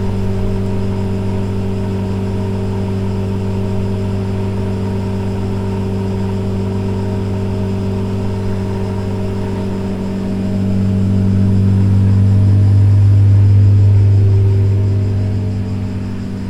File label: refrigerator